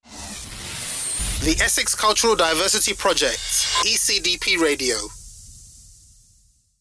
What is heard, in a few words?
Jingle with sfx